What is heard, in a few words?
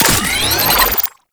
Alt Fire Charge; weapons; Scaldra AK